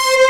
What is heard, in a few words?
Ending loop; SNES; Dragon Ball Z Super Gokuden - Totsugeki-Hen